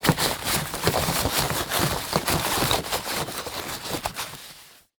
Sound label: Sports Bag Golf Bag Rummaging Through Pocket